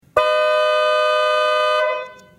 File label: automotive horns; piaa